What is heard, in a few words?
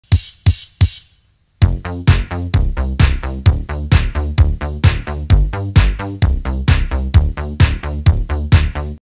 dance music